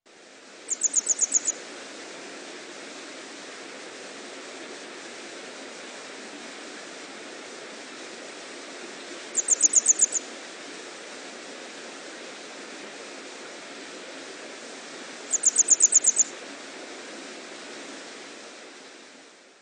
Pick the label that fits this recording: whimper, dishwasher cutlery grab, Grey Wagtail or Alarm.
Grey Wagtail